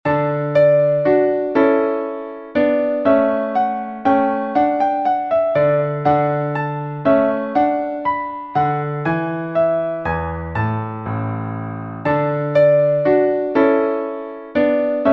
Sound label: menuet; purcell daniel